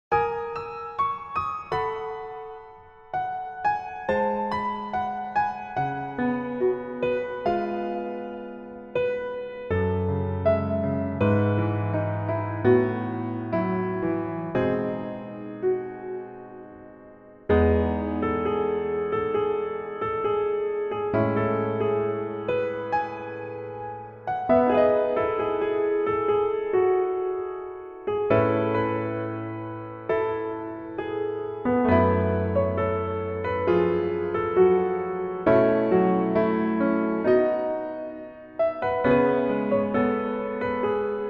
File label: Piano MR, 멜로디MR